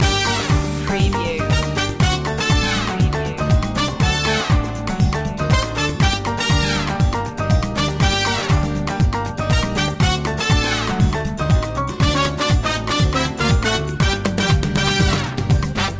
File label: hot summer samba loop